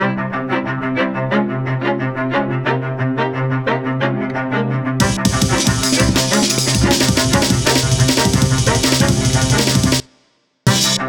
String

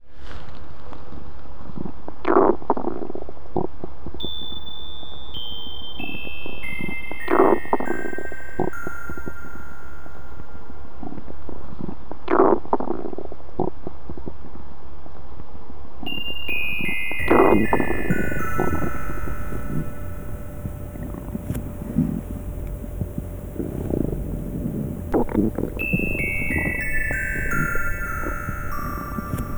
stomach song

